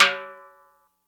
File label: High Timbale; Groove Elements